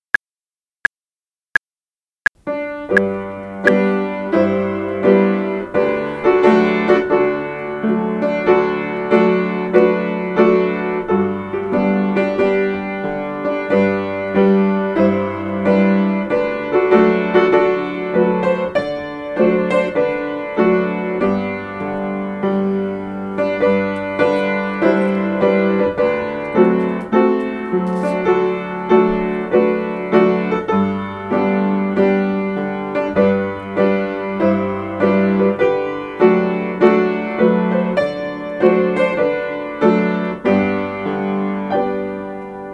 Wise Man Slow, piano; The Wise Man built his house upon the rock (slow)

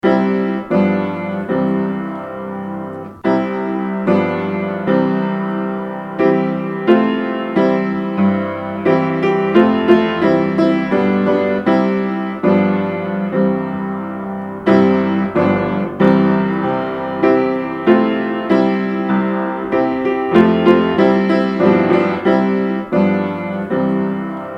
God Made Me, piano; God made me, God made me, in my Bible book it says that God made me.

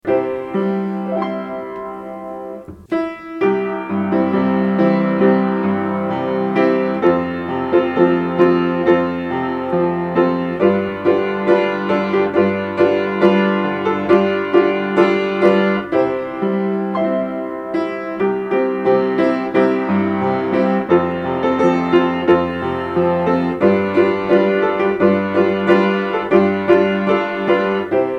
Piano Music; Piano Music to accompany Songs; The B-I-B-L-E, yes thats the book for me, I stand alone on the word of God, The B-I-B-L; "Discovering God" Bible Studies

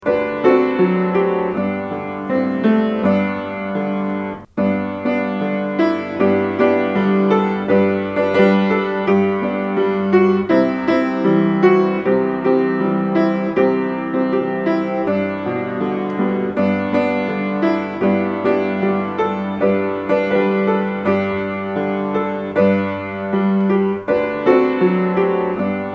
Do Lord, piano; Do Lord, Oh Do Lord Oh Do Remember Me.

